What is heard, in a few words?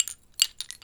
Quick Shell Rythym